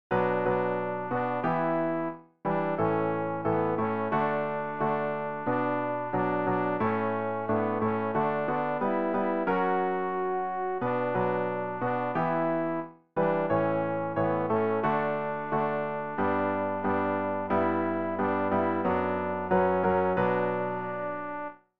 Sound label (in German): alt, Übehilfen für das Erlernen von Liedern; ref gesangbuch